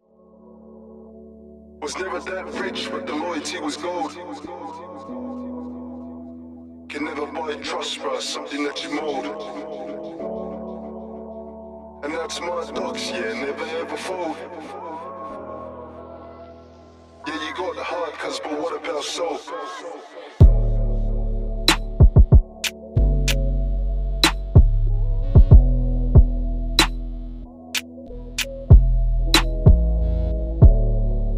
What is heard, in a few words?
Freestyle Instrumental